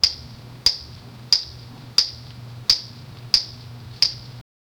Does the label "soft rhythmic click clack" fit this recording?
Yes